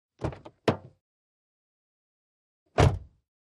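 Montero Door Open Close, 1998 mitsubishi montero ext.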